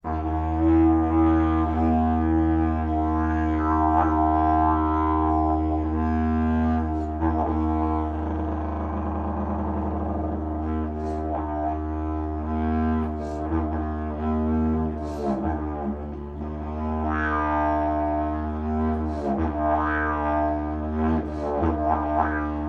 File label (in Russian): didjiridu skladnoy